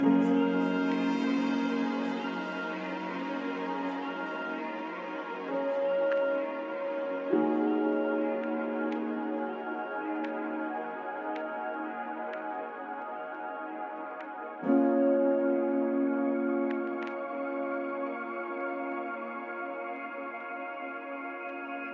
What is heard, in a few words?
BPM 175